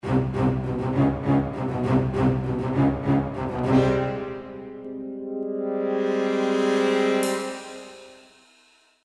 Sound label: crescendo; Dans les banques de sons, les samples de crescendos ne sont pas forcément de la durée souh